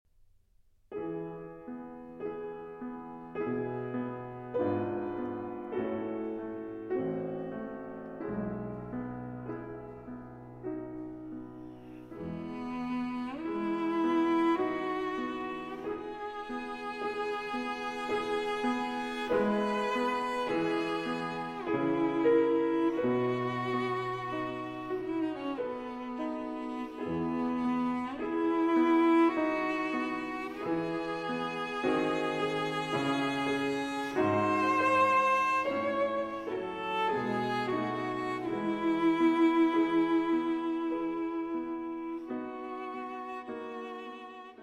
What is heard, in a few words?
Sonata in A minor Adagio; Arpeggione; D. 821 II.